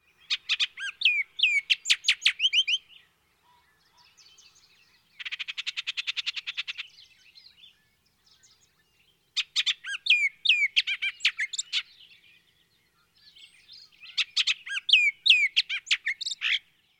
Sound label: bullocks oriole